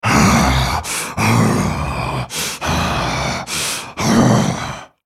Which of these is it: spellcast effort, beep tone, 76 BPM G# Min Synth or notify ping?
spellcast effort